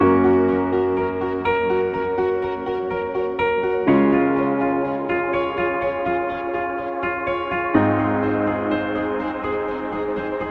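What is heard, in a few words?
Farm, Upbeat and happy background music; conference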